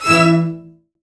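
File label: String Section; CMI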